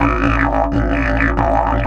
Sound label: 130, Didgeridoo